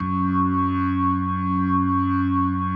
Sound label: F#2S, B3, Vintage Keyboards